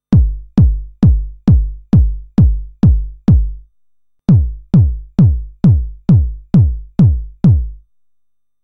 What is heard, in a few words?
bassdrum tune, TR-909; modifications